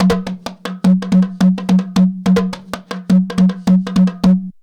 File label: JUNKU RIFFS; Best Service; World Colours